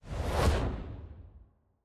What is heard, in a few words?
Lunar Beam; Chupa